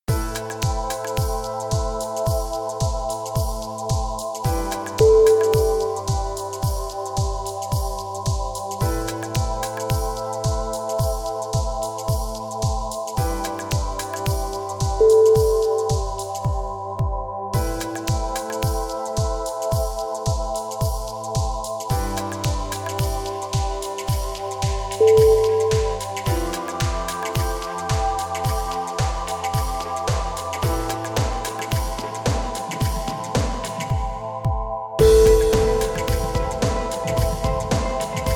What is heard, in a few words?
chill electronic